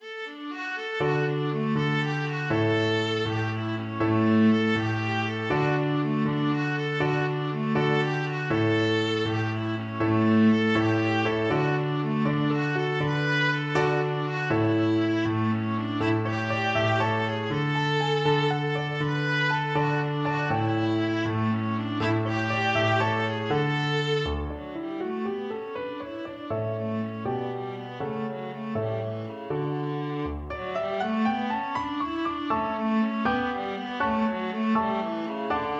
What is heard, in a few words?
viola